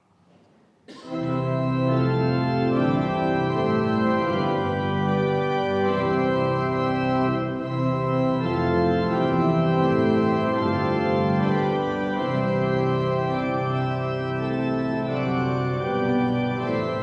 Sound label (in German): Orgelausgang; Orgelstück zum Ausgang Eric Georgi (Zwickau-Planitz); Gottesdienstes vom Sonntag Septuagesimä 2026